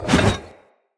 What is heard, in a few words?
Hammer Whoosh; Weapons; Enemy; Blunt; Hammer And Shield Weapon